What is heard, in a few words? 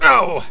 Pain